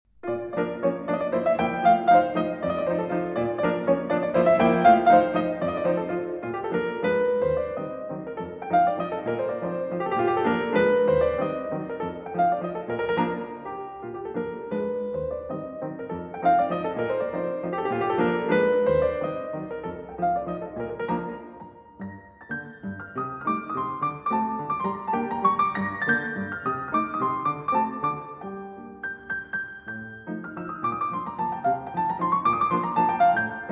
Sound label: Chopin Mazurka No. 52 In B Flat